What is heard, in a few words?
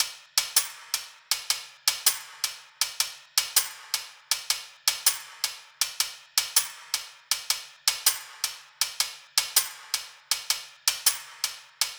High Perc Loop 80; Drums; Loops; Ghosthack - Quantum Force 4